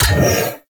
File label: Library Hologram Turn On; Warframe; Duviri; Library Island